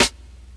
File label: Snare